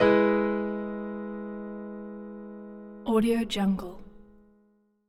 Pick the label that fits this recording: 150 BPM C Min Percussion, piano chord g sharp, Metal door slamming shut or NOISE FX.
piano chord g sharp